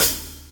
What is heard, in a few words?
Hat Open Quarter, hihat; drum hits; Ableton; Factory Packs; Drumdrops Recording Hybrid Kit